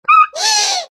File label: tookie squawk; tiki-beats; eyecon